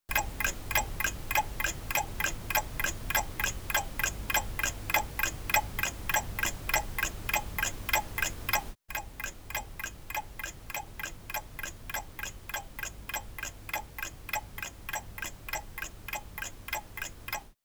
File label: neu m7 noise; Test mikrofon